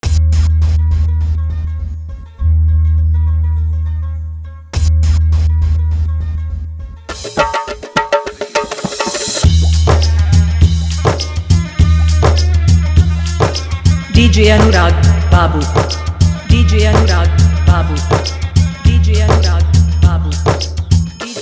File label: Holi Sad Dj Song Hard Dhollki Mix